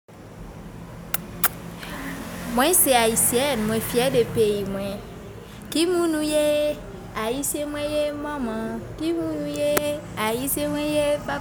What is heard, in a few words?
singing, haitian creole